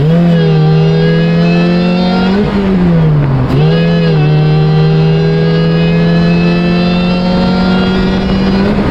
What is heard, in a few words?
engine, lotus; motor